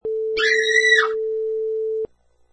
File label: Female Scream